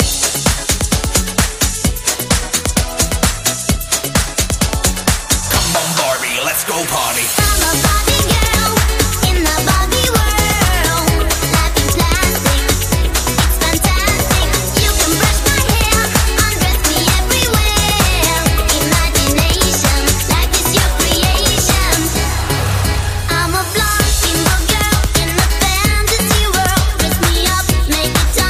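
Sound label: REDRUM 130 bpm D flat minor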